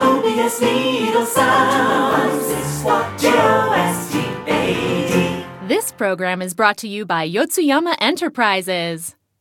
Radio Jingle; let it die; OBS

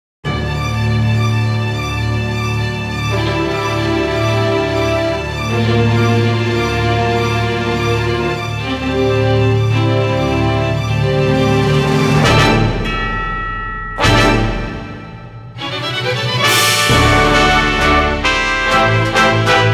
Stage BGM Thunderstorm; otomedius x

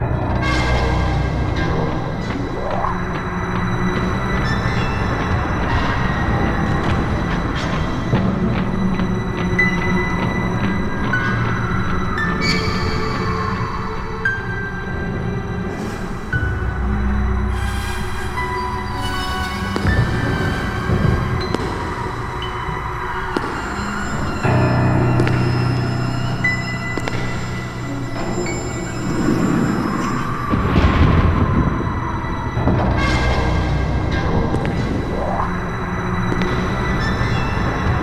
Horror; tokyo mirage sessions fe

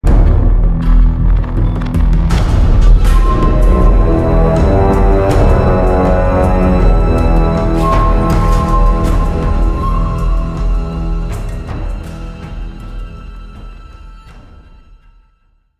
Mp Defeat; Call of Duty Modern Warfare 3

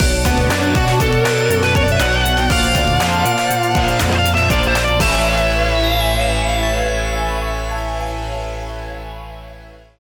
Jingle Gameover; Arcana Heart 3; Xbox 360

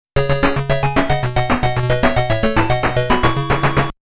Round Clear; Legend of Joe Musashi Shinobi Music Collection

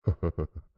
Mask Laugh; Lethal Company

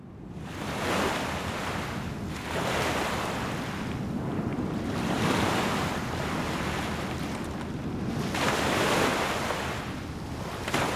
Ship Wave; Wario Land: Shake It!

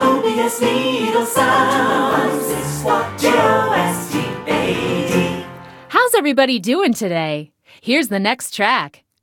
Radio Jingle; let it die; OBS

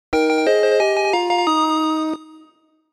Obtained a Key Item

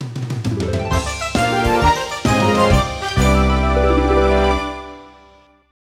Jingle Low Score

